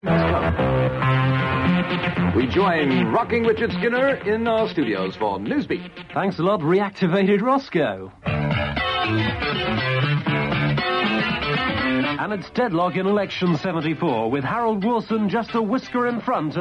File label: newsbeat